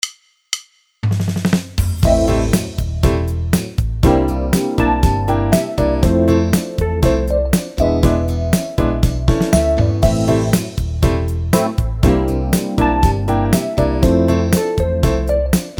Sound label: Epiano B3 Blues Rock Yamaha; Wersi